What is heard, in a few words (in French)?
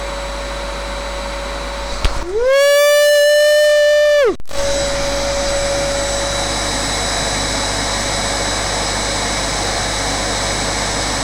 Aspirateur; Moteur